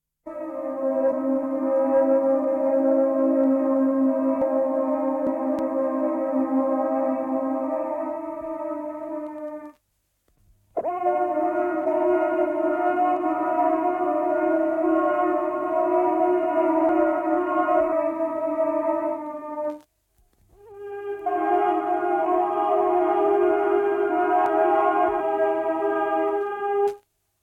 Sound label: Building Chords; Amor Dei